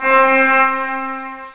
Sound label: pipe organ brass; OctaMED SoundStudio V1; mirage